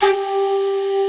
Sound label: G3 R, LEAD; Music Store - Claudius Diemeter Schwerlos